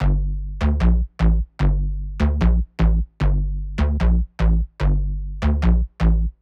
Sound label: Tech Bass